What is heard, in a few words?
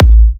Kicks A; markus hakala